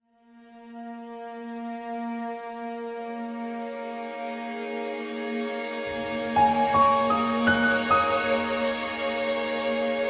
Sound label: strings